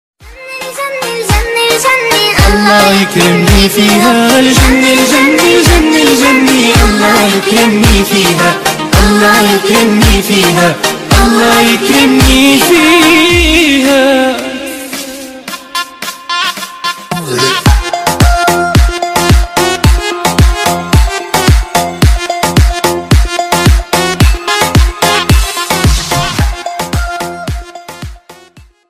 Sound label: arabic famous remix ringtone